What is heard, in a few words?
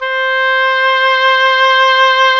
WND OBOE2, Oboe vib; Roland LCDP04 Orchestral Winds; Oboe 2&3